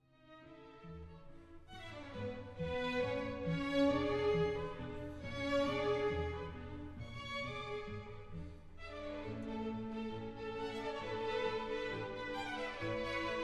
Concertos for Piano; Mozart; Sondeckis; Allegro

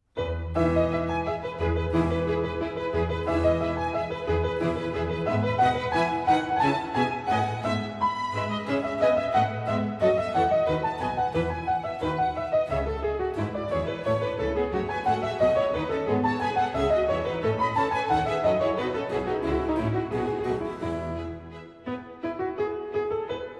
Solo Concertos, Keyboard; Bach; Sondeckis; Musical Heritage Society

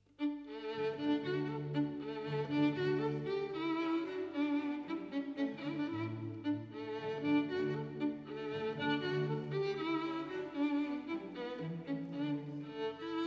Violin Concerto; Beethoven; Sondeckis

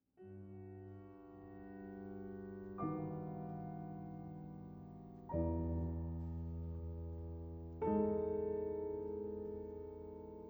Piano Sonata; Kirilauskas; M. Maros

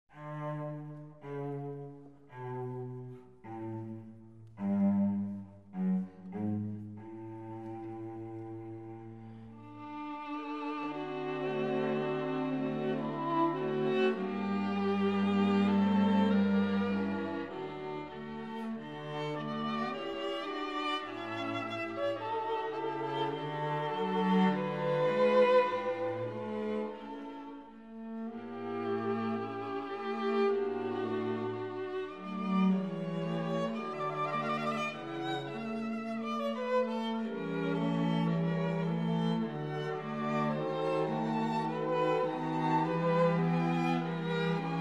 quartet; Beethoven; Serioso

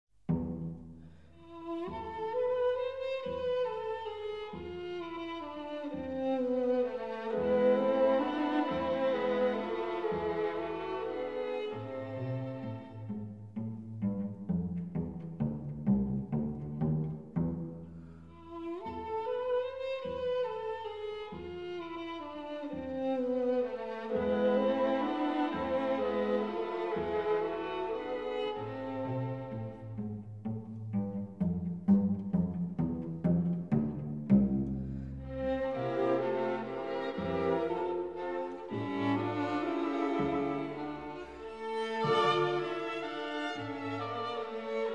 quartet; Beethoven; Rasumovsky no 3

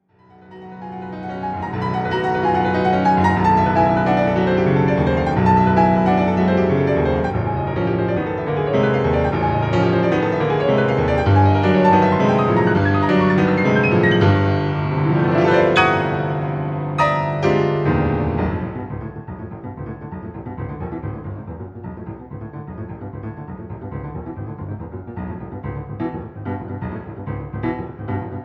Piano Sonata; Kirilauskas; C. Vine

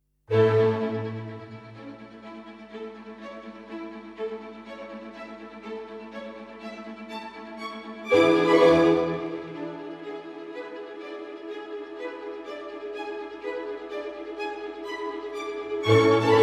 Violin Concertos Violinkonzert; Mozart; Sondeckis; Violinkonzert Nr. 5

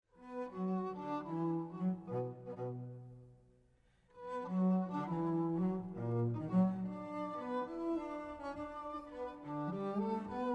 Quintet, Allegretto

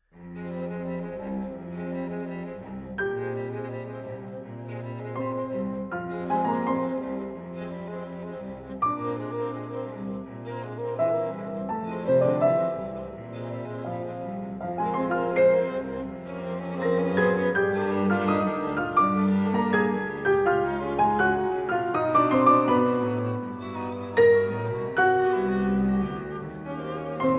Quintet, Moderato con moto

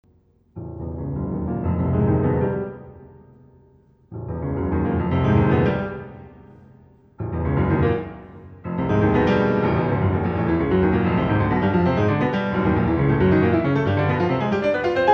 Piano Sonata; Kirilauskas; M. Maros

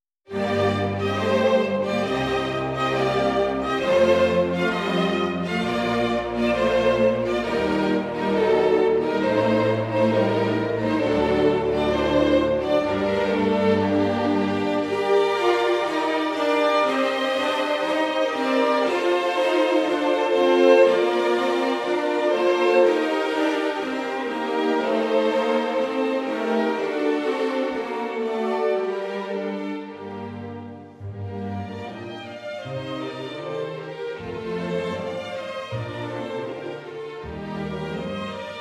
Serenade for Strings; Tchaikovsky; Sondeckis; Aslamazjan

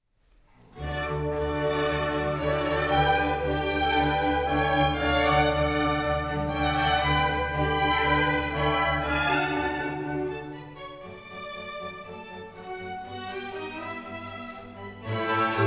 Flute Concerto Allegro; LNSO; Mozart

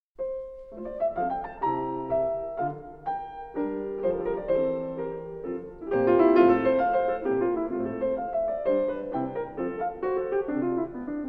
Concertos for Piano; Sondeckis; Mozart

